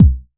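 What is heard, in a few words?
CLUB bass drum, CLUB KIT; Wizoo - Platinum 24 Electronic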